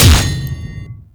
Hammer Glass Impact Default

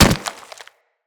Infested Runner Step Default, Footsteps

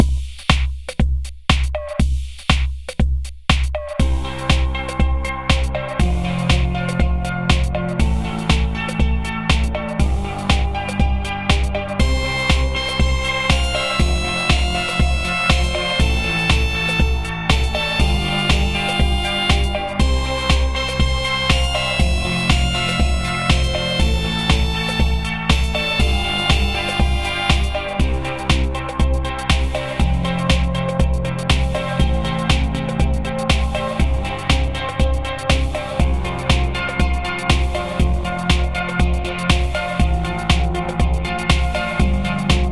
Market Music A; Warframe; Venus; Fortuna

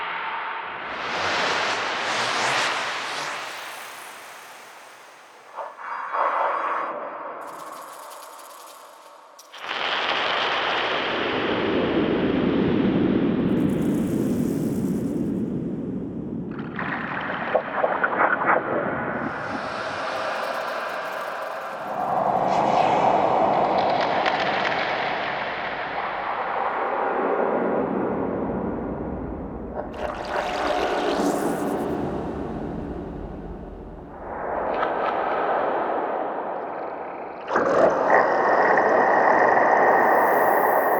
Museum Amb A, ambience; Warframe; Vitruvian Museum

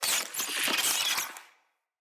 Infested Ashen Vocal Attack Death; Enemies

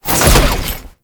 Dodge Land; Enemies; Abilities; Warframe; Tau; Dax Ninja

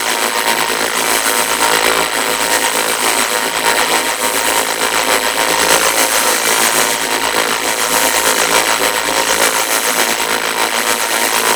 Practice Orb Loop A; Warframe; The Teacher; Mod Quest

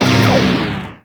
Proj Large Fire; Warframe; Lizzie

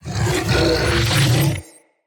Root Spike Cast; Bromius

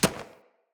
Short Sword Medium Impact Textile; Weapon Impacts; Player; Swords; Environment

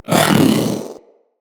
Companion Vocal Attack Melee, Pets; Zanuka

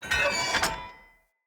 Light Mirror Move E; Puzzles

